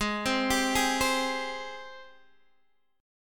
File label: Ab Major 7th Ab down Guitar Open D